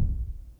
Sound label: SYNCUSSION